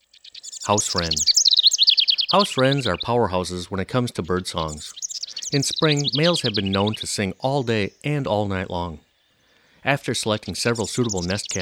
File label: House Wren